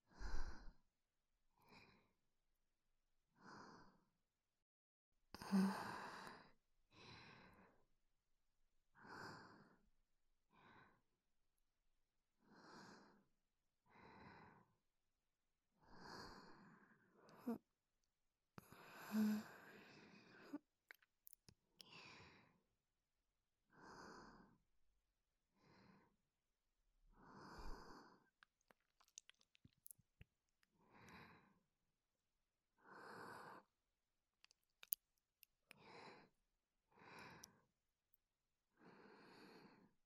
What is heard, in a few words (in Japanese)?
天使の吐息